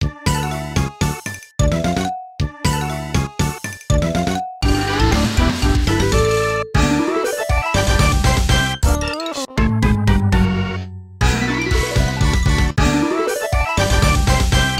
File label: Jingles; Young Cricket & Master Mantis